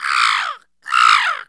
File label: CROW